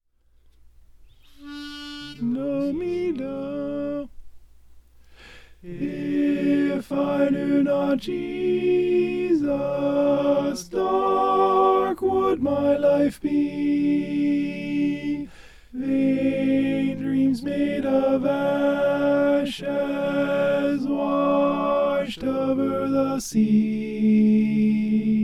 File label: alto